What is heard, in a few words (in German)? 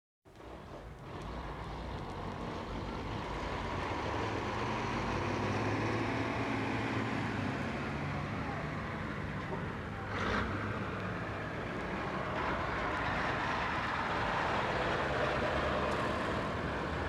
grosser laster im verkehr; Großstadtgeraeusche